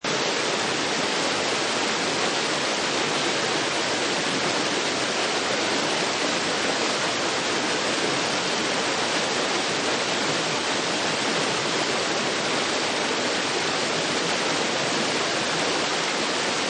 Long River Loop, A long sound of a medium to heavy river flowing evenly; downstream. Seamlessly looping sound.; Forest